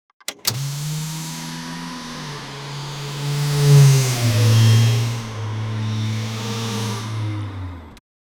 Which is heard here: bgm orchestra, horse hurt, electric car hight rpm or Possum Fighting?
electric car hight rpm